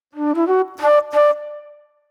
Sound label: Mellow Flute; Sonic Foundry (Sony Creative Software); Manhattan Flutes; Mixed Berry Jam